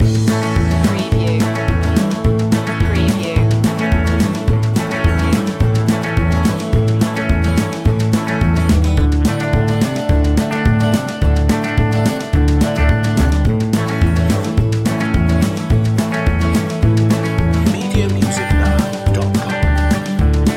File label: on the range loop